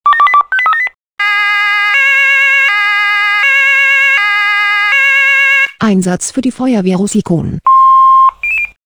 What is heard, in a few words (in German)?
SMS Alarmierungstone; FW Russikon